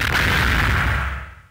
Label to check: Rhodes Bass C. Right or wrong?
Wrong